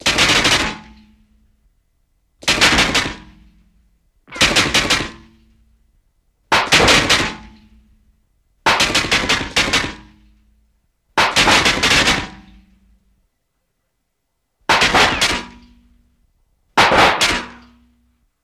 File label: Bullet Impacts Vari, metal